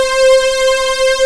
PAD C4, SYN PAD; MKS

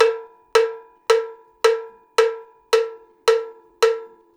110 COWBELL, Percussion Loops; USB Soundscan vol.36; AKAI

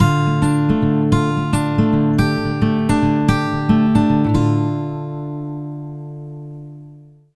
110 MIN PICK D, Acoustic Guitar Loops; USB Soundscan vol.04 - Electric & Acoustic Guitar Loops; AKAI

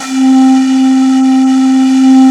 PANPIPE

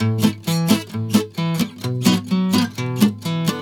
GUITAR, Balkanish Traditions

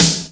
BRIGHT SNARE; WORS STATION; DANCE #3

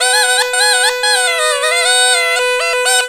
PUNGI

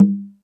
Jungel Percussion, E-MU Pro-Cussion; drum machine

